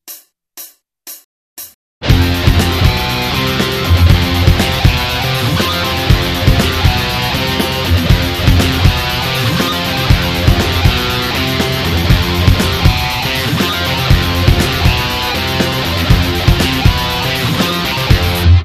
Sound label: tap jam